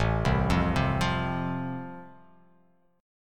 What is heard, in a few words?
A Minor Sharp 5th A, chord; 5,3,3,5,5,x,x,x; arpeggiated; JGuitar